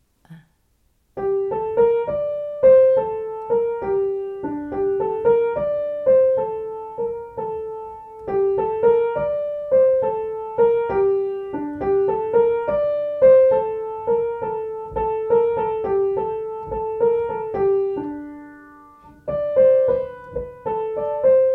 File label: hymne sinagot paimpolaise piano